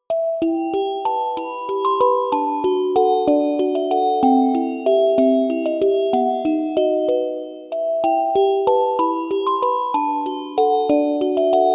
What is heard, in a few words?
childish ringtone